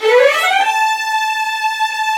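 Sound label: up, violins, runs; Roland - String Master Series; Vls Runs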